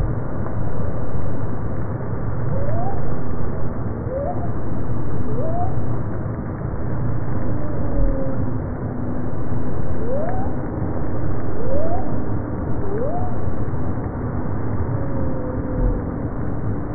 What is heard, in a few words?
humpback whale